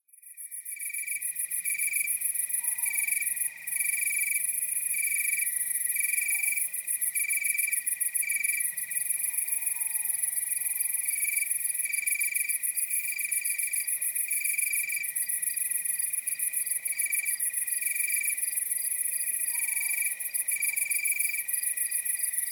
Nocturnal Wasteland Sounds Toads, Nighthawks and Crickets; Nature Chill Out 2014